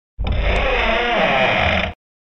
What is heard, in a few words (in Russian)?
korotkij skrip